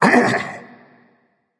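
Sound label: non verbal ouch, voice; cernunnos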